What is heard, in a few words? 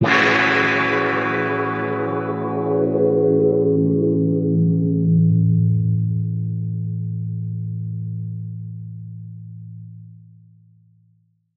Guitar Chords Amaj; Zero-G - Future Wave